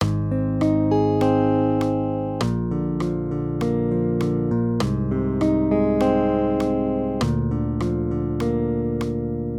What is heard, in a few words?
maj triads